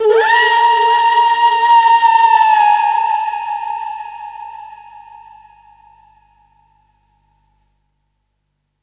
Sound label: ringtone